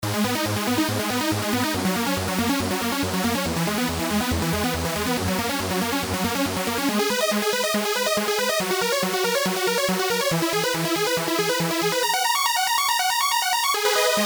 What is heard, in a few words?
Trance Lead